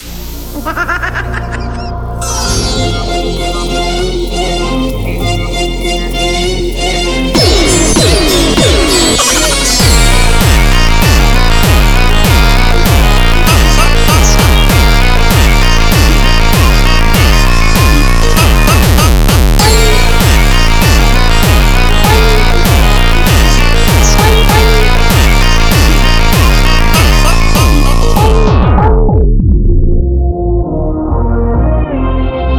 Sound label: Jumpstyle Slowed